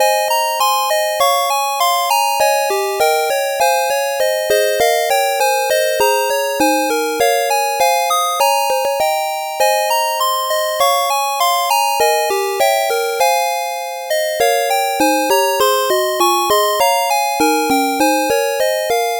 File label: 8bit bgm fast